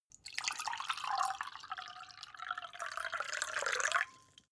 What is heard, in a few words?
glass pour soft; soundpool